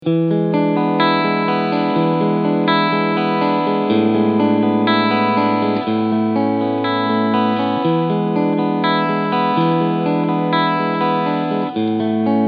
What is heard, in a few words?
Ibanez RG657 Cleansound Position 2